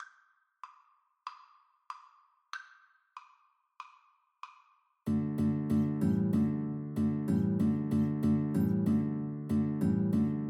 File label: Guitar Duet; vivaldi autumn